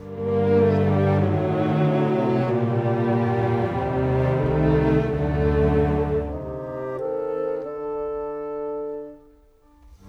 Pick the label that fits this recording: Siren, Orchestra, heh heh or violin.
Orchestra